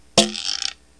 drum, EMU Proteus; Proteus1

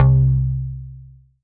C Bass

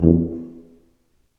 TUBA, Tuba stacc; Roland L-CDX-03 Disk 2

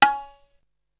Perc; ZERO G - Datafile DISC 3

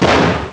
gunshot; Amiga Soundtracker Sample Packs

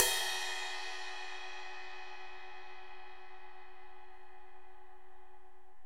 Ride p up; Digital Sound Factory

